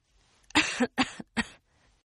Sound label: Cough Female; Sickness Sounds; Human; Partners In Rhyme